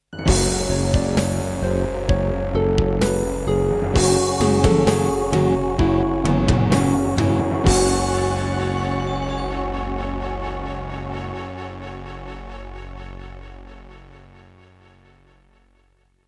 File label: Introduction, Roland SCB-7; Doom (Sigil)